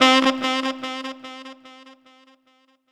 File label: Sax Echo